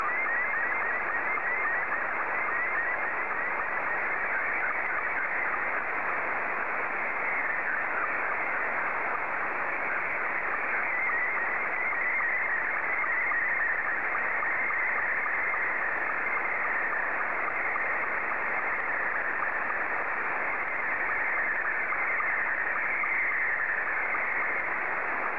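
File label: Scottie 2, slow-scan television; JL1FQZ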